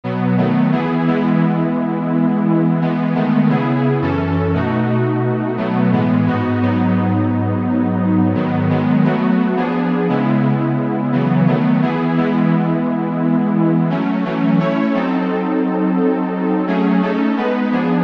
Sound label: Lullaby instrumental